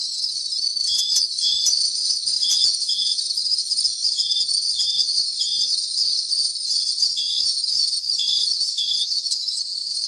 Insects Crickets at Night; forest jungle ambience

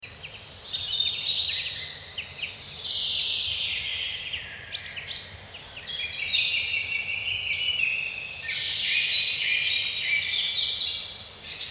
Forest Ambience

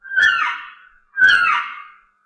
Fox Bark; Jungle scenes

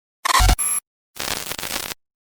Static Interruption, glitch sound effects

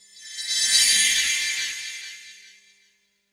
Glassy Sweep Transition; slow motion transition

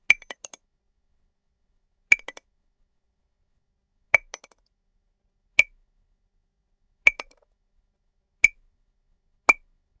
Candy Cane Stick Tap; christmas

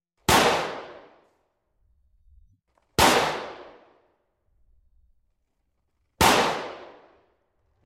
Pistol Gunshot Sound; weapon

